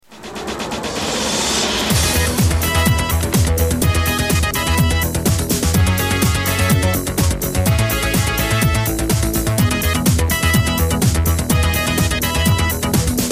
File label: ringtone jingle bells